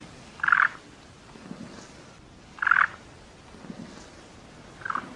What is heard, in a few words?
turtles peeping; turtle nests